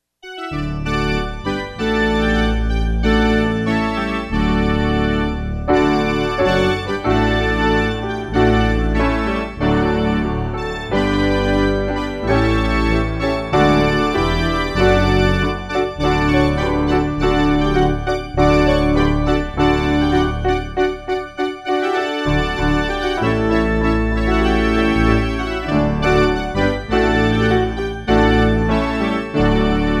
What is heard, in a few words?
instrumental reference mix; Joy To The World